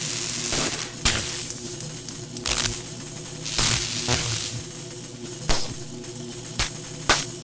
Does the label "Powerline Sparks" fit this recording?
Yes